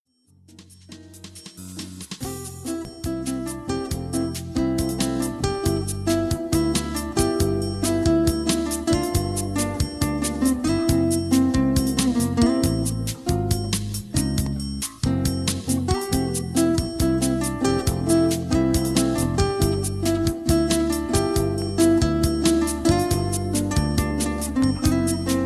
Brazilian Guitarist

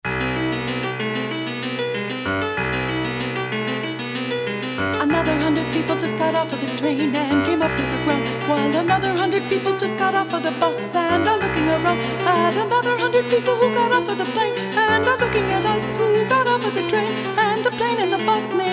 Pianist

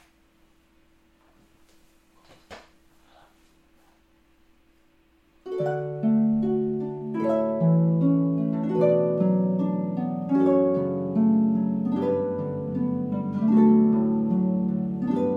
Harpist; Houston

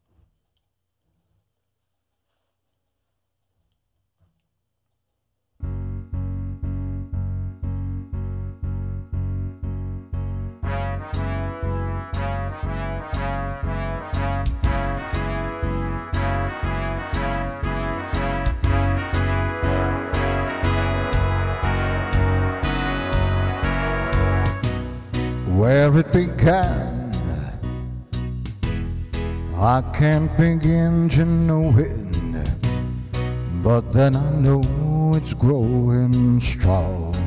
Tribute Band

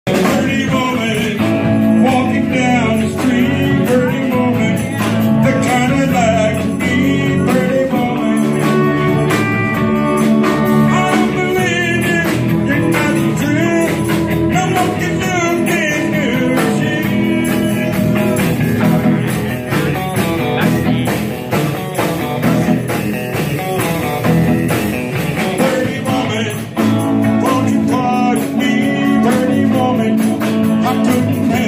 cover band

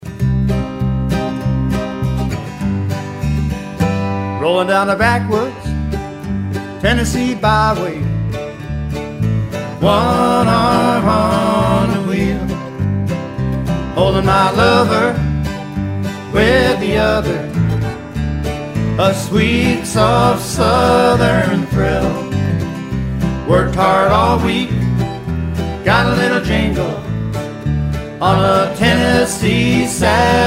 Country Band; Hartford

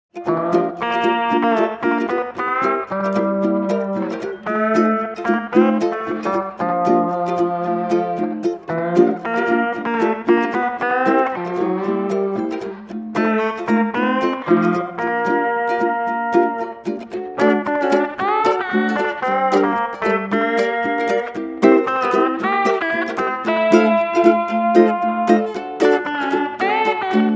Beach Coconut Uke Bb 114bpm Shuffle

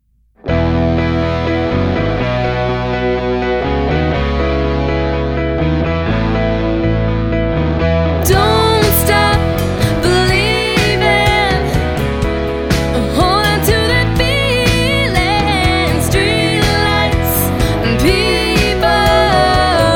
Wedding Band; Nashville

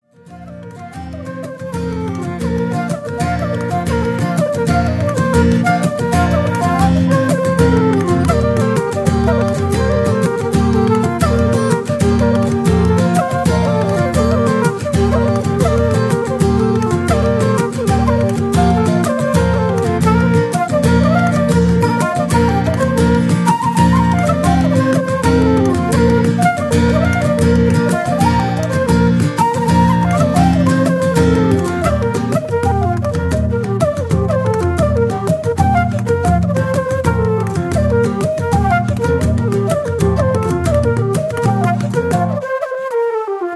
Irish Band; The Kitten

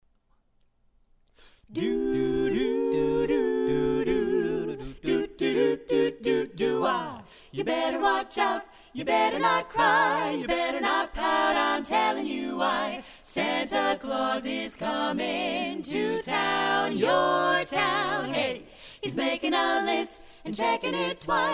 Christmas Carolers

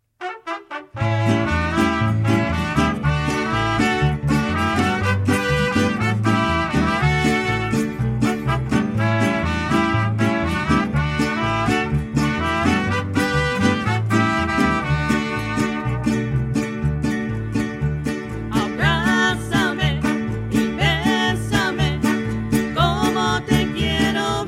Mariachi

